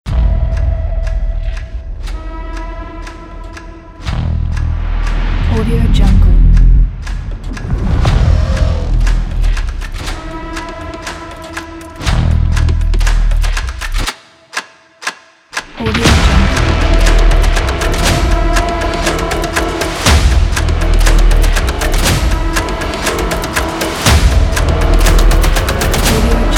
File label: countdown trailer opener